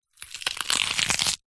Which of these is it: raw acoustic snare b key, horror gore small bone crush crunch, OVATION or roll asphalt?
horror gore small bone crush crunch